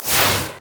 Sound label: carpet bomb; rumble